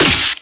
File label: snare